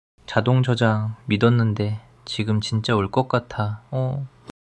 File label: crying